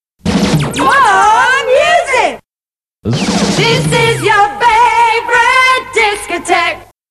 jingles, more music

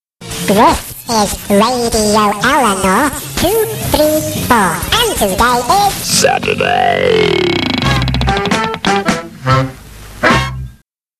Jingles

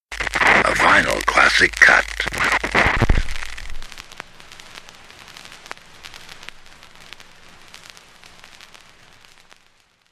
vinyl classic cut

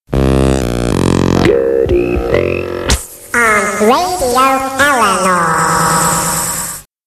Jingles

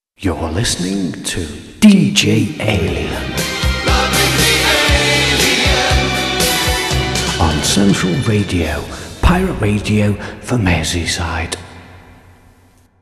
jingle; DJ Alien; Central Radio

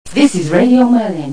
jingle; RMI

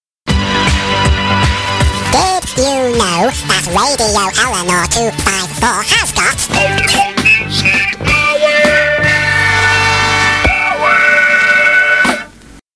Jingles

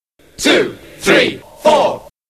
Jingles

